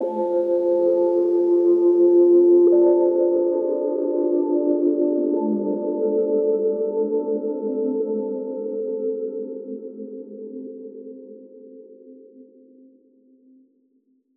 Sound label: Em90 piano chord